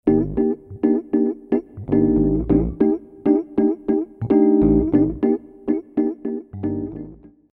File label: sparkle, fender rhodes sparkletop